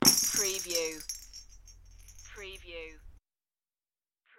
Christmas tree bell decoration; beanstalk audio

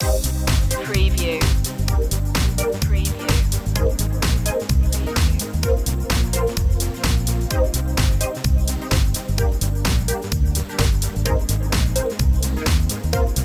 up to this beat

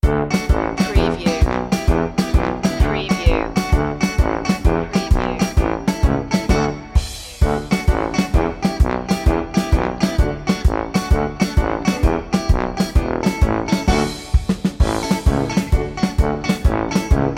polka hey underscore

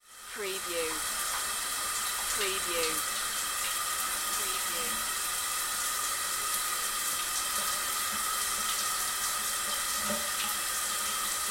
Shower Run Long; Sound Effects Bible

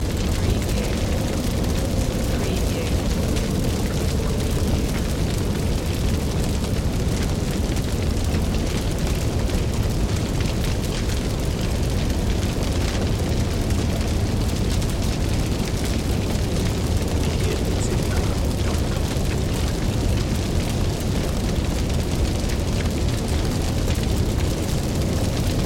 House Interior Crack; Sound Effects Bible

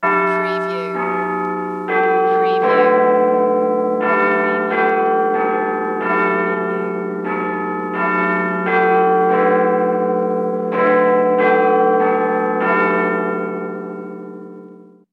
Big Ben

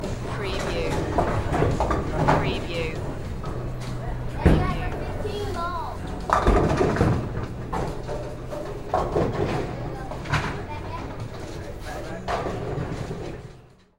Bowling Alley

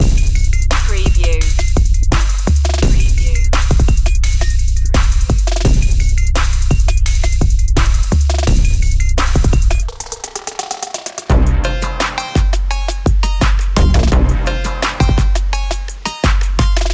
hip trap loop

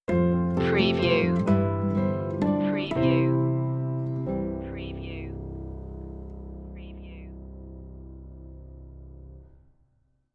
natural ways stinger

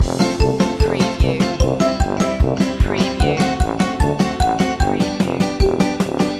cartoon mood loop

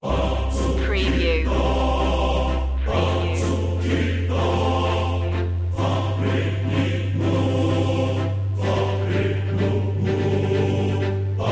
marcato strings choir

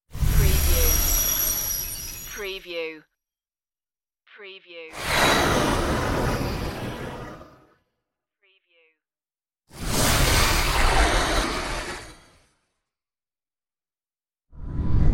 deep sliding whooshes

